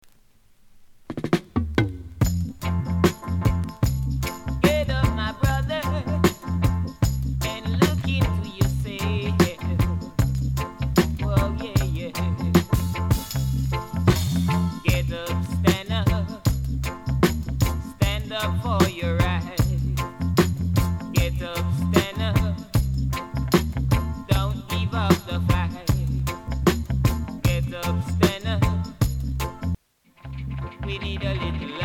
roots reggae party

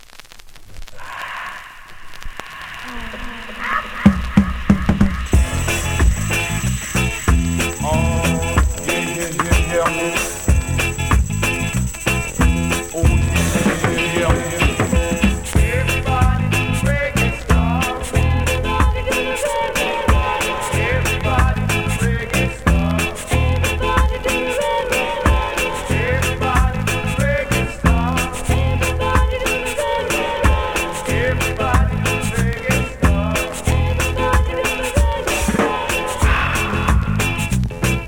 reggae stomp

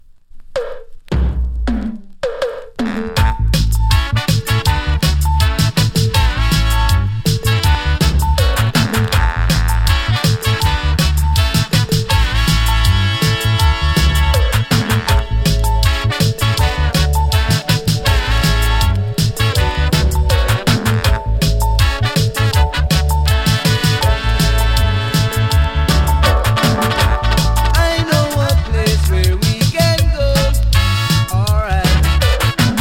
bubbling

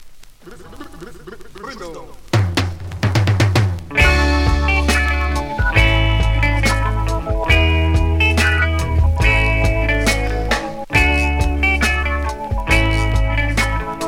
talking blues

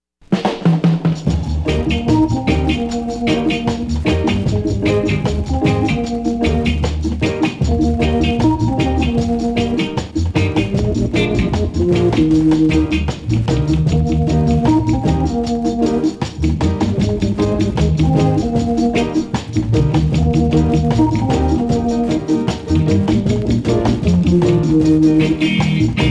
instrumental

